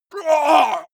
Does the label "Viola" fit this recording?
No